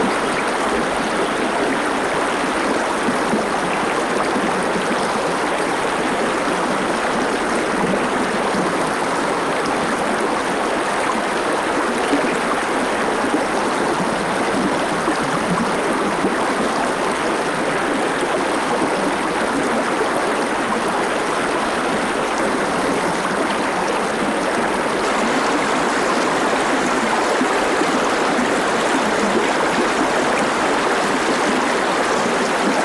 water river lyna medium flow close perspective and birds; stereo ortf; sonniss gdc